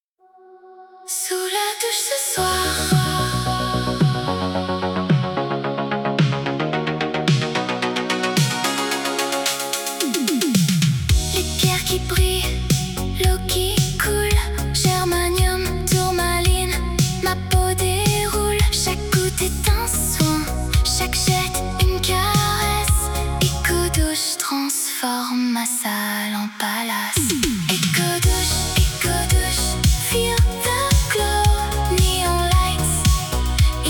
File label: synthwave; suno